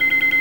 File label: SENSORS; ast miner